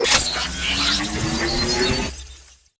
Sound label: Reboot Power Up; AI Stalker; Titanfall 2